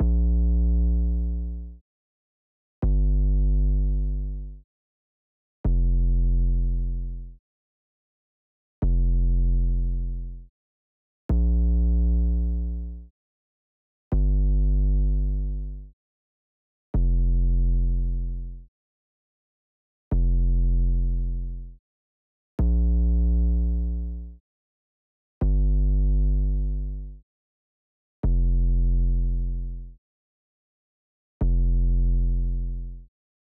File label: 808 Dry; TrapDrive